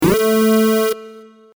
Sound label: alert